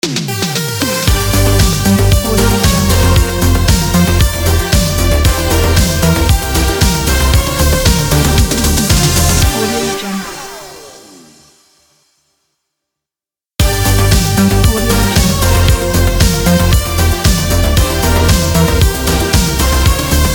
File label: 80s synth pop logo